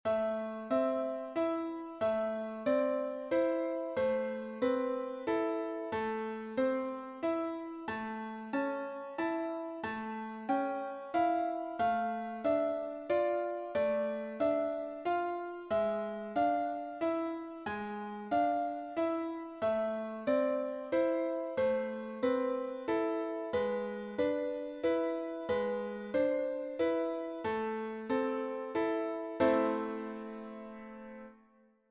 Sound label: piano